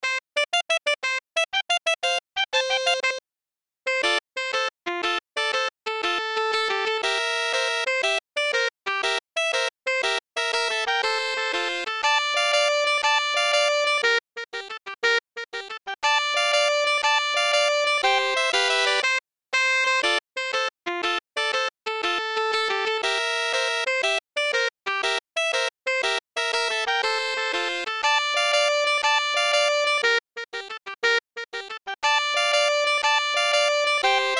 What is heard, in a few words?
King Cotton March - Sax Trio